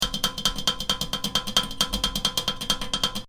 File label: Groove